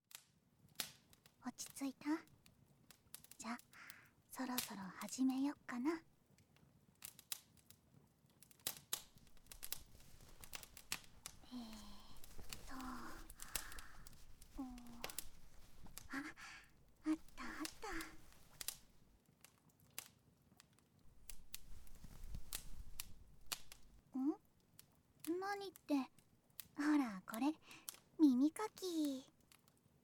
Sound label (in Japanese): 耳かき